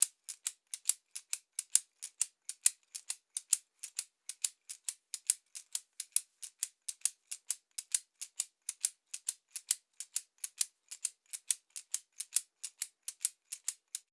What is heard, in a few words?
Dogbone shaker; Warrior (Tohoqashaw)